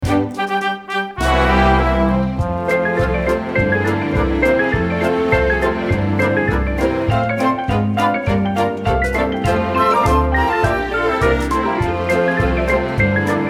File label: INST. EXCERPT; audition materials cinderella